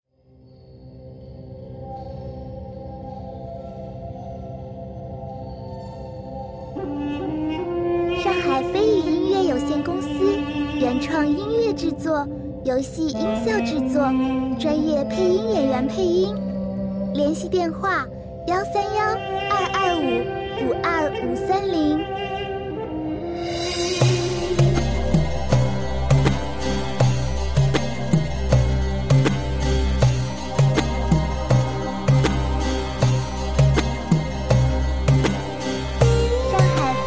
神秘轻快; 街头战士异域

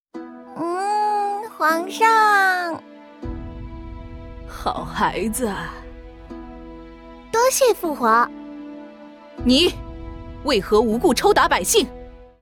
女 BGM

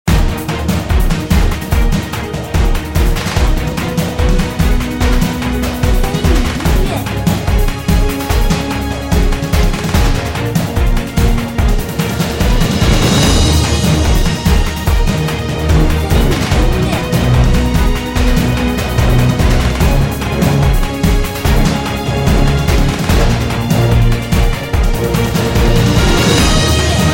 PVP战斗音乐

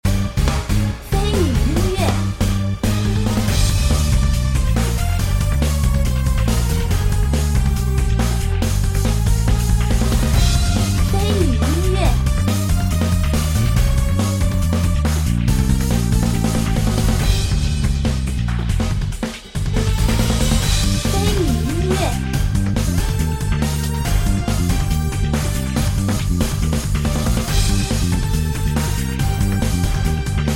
激烈 战斗 紧迫

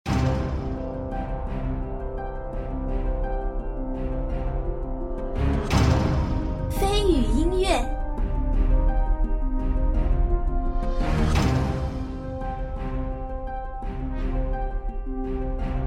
主城音乐

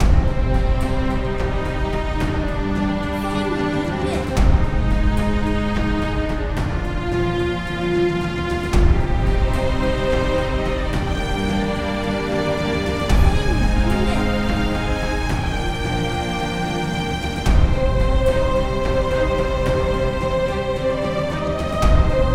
登录-史诗-氛围

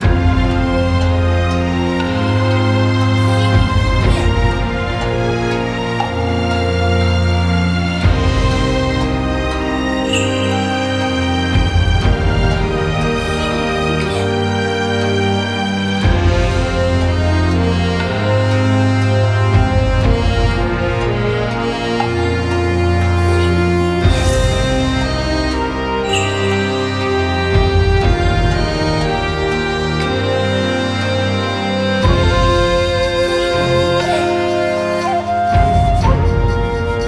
世界地图

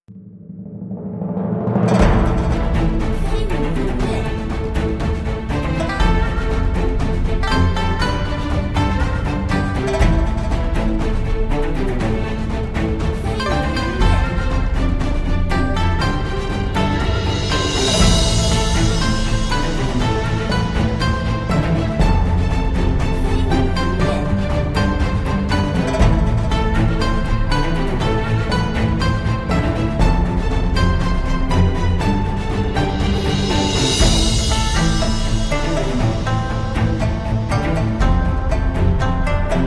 战斗多人

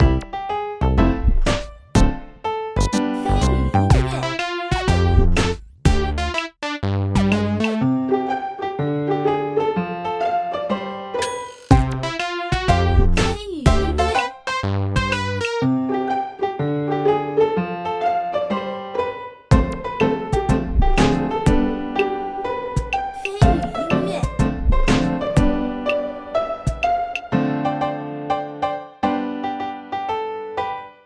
Q版-休闲

